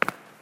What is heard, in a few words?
Footstep; Five Nights at Ronald's